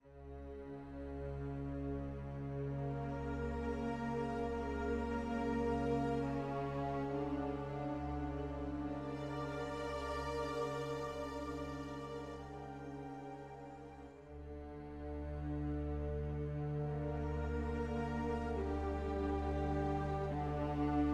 Intermezzo

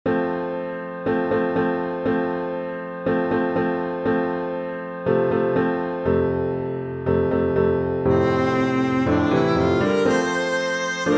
Viola and Piano Short and sweet-ish

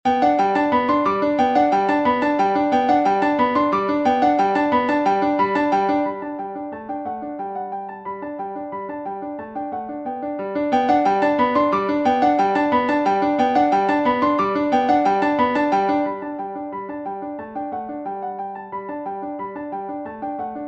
Piano

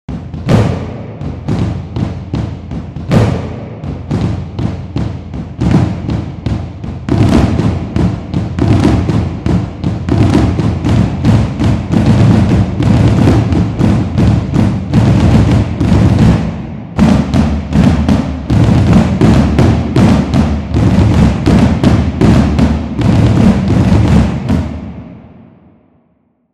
drum track, cinematic drum

